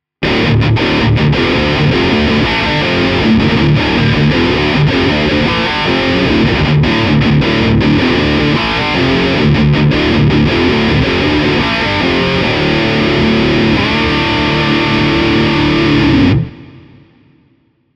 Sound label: Engl Fireball 25 Scoop